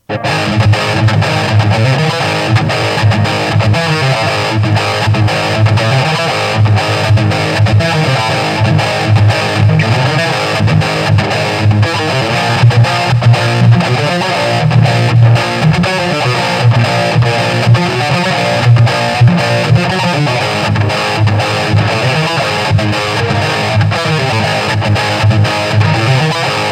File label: riff